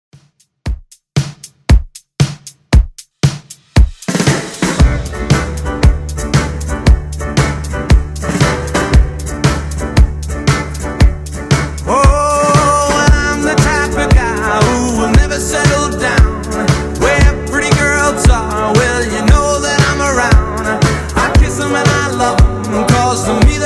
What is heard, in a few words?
60s Real Drum 116bpm